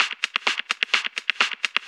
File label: FX Loop 128 BPM, House